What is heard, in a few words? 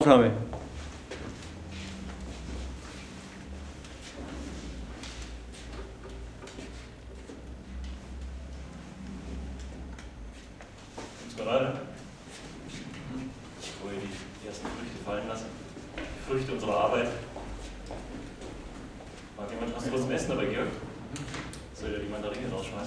Juggling